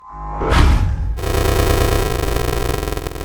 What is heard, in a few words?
boss spawn